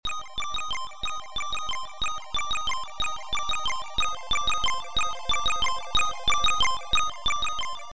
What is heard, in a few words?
Carol of the Bells